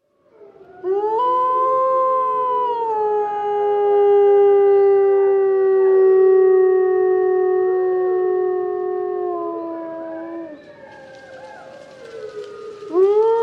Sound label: grey wolves howling